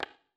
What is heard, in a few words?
surface wood tray, dice; darkwatch